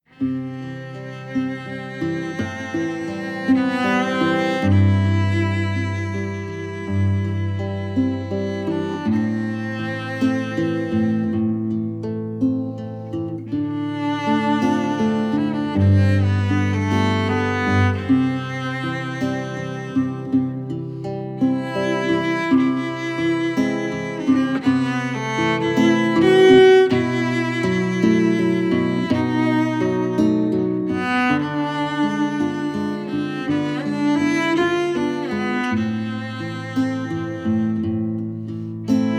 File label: Ave Maria high, Guitar and Cello; recorded at FTM Studio in Denver Colorado